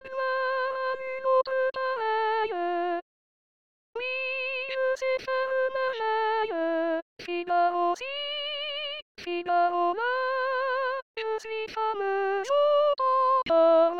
canon 2 voix; figaro

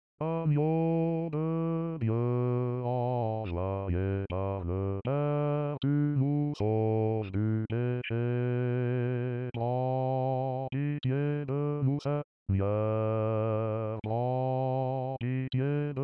agneau de dieu messe de la trinite basse

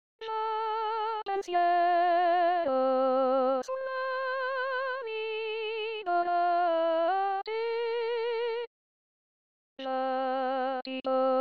choeur des esclaves soprano

